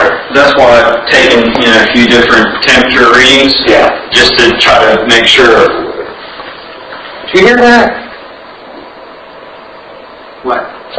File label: Unexplained Grawl